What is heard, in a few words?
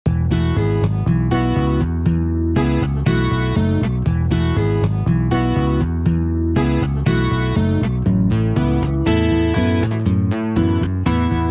Acousticville